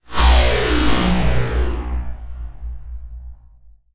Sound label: Battle start; blastmorph pinball; xbox series xs